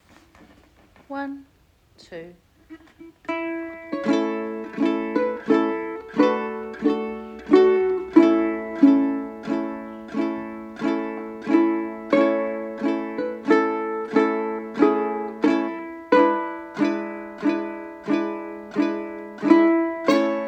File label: Uke my Bonnie; Uke book